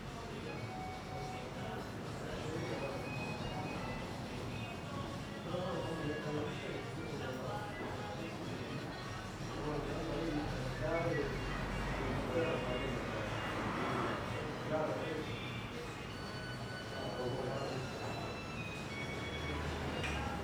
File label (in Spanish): AMB INT MANANA BAR; Los Yuyos UY Generico